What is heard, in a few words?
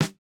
Hiphop snare; Dry original